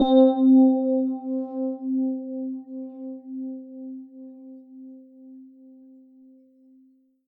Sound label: kawai k3 electric piano c4